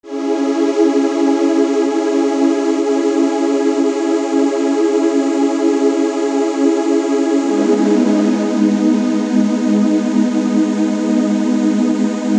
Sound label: Pad